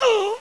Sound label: pain; hl1mp; XDM; hassassin